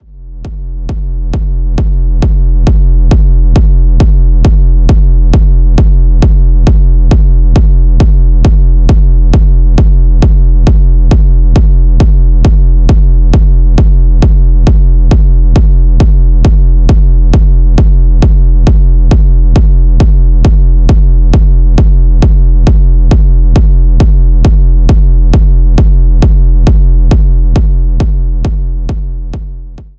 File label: signature kicks; zumstein